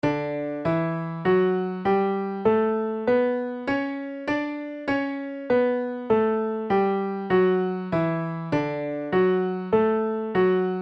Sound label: tuneup D Major; two part reading level 3